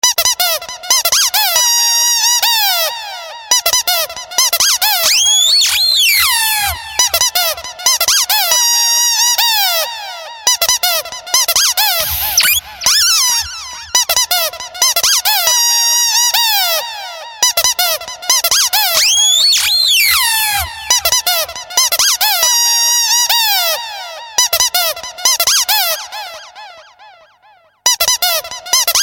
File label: Ducktoy; meloboom